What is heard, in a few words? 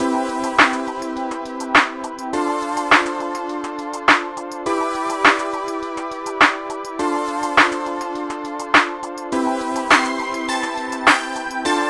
Bgm Neutral, 103, 2 beats; Brix